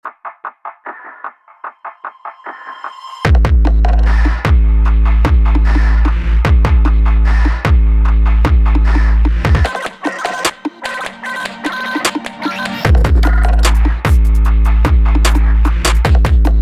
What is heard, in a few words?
URBAN